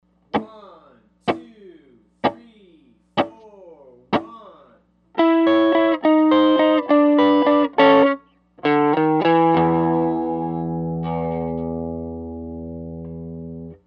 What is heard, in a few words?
Blues Turnaround in A